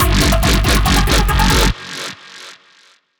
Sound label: dubstep club bass